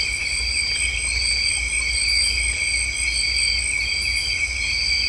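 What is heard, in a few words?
cricket ambience, swamps; ambient; Left 4 Dead 2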